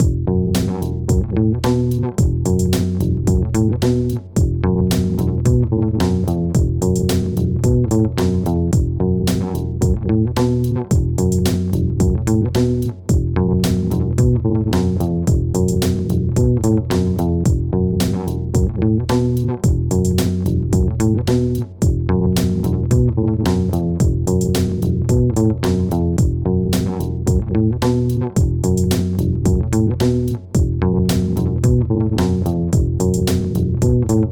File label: Bassing HH, Instrumentales